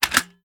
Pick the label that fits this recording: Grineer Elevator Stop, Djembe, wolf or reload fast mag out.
reload fast mag out